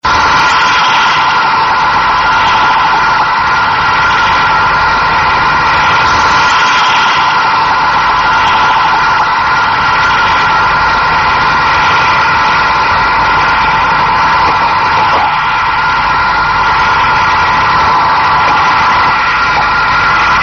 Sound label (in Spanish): Embarcación ligera: embarcación ligera con motor de gasolina a 200 r.p.m.; subacuatica